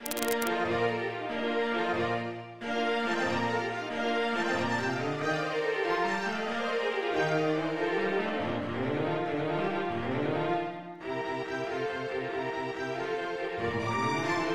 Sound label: String Quartet in Bb major; MIDI; Haydn